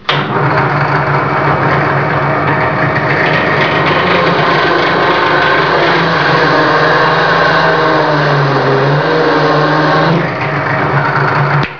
Bohr; 999wavs

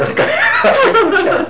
LACH

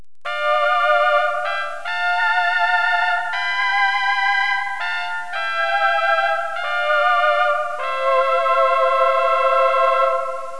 TRAUER

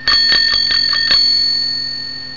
KLINGEL

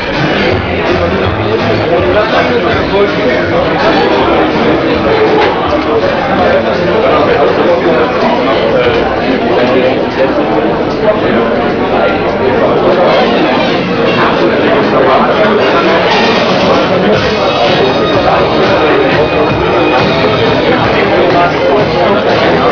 Kneipe

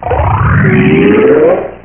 dreh; puzzomania

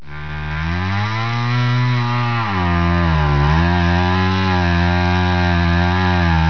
Ketten

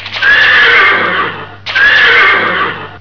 PFERD

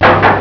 KLOPFEN

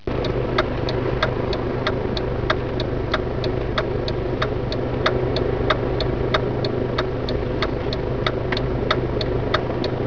BLINKER; 999wavs

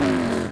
Saug; DEMOUK

